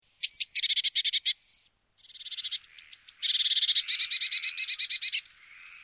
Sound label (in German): die blaumeise stimme; So klingt die Blaumeise